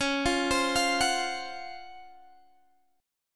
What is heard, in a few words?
Db Major 11th, Guitar Standard; arpeggiated; JGuitar